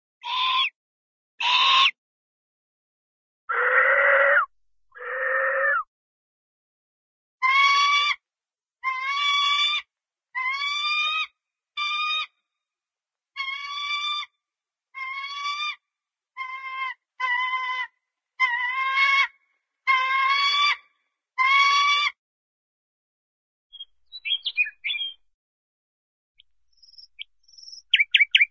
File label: bird, hawk single hawk chirping, animal; cartoon